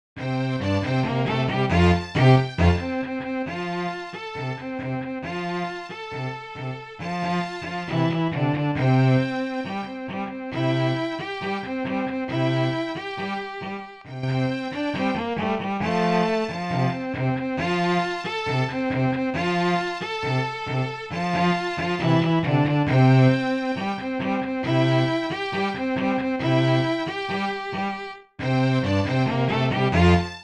La Cucaracha Quartet, Bass

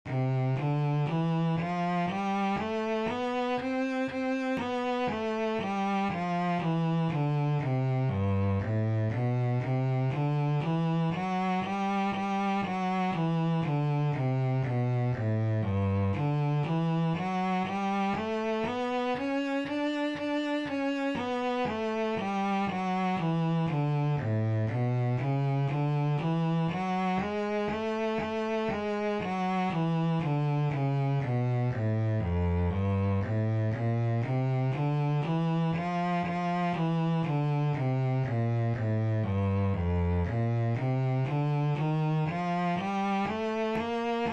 Seven One Octave Scales Bass

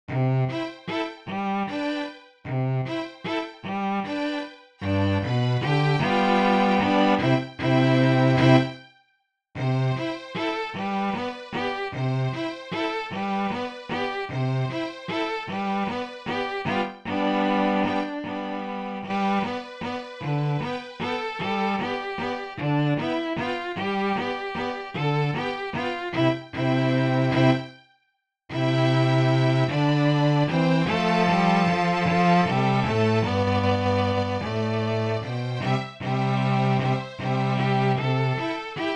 Cielito Lindo Quartet, Bass

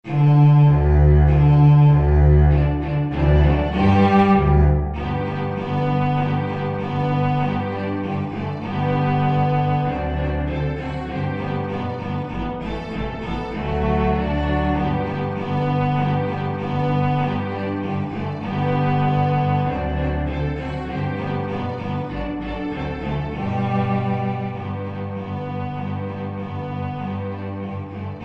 Jingle Bells, Folk Quartets

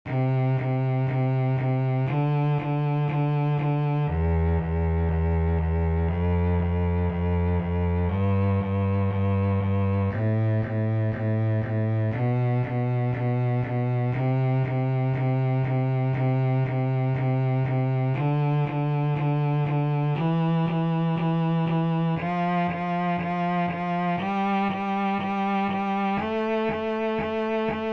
C Major Scale Bass

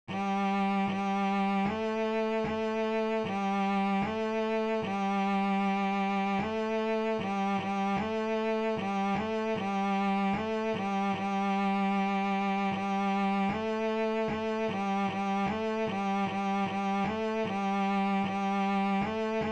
G string 2 Notes, Bass; Bass for Camp

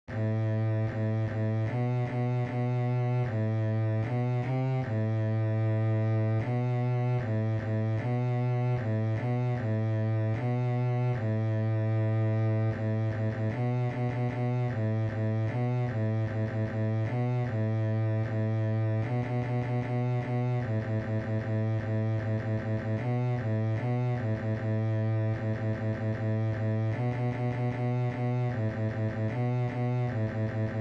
A string 2 Notes, Bass; Bass for Camp

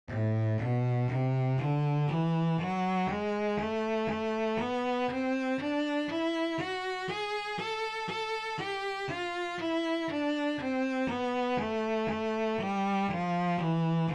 Two Octave Melodic Minor, Bass; Scale

